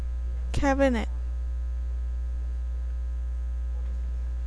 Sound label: cabinet